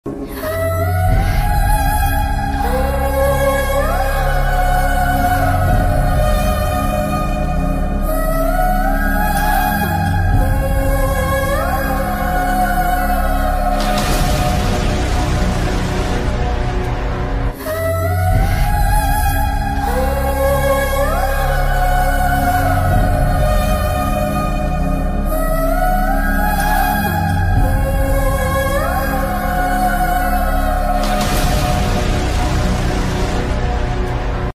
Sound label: sad ringtone romantic love ringtones sad song; sarrainodu movie